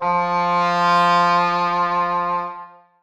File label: cor anglais f3; Sonatina Symphonic Orchestra